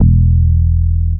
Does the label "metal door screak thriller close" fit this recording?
No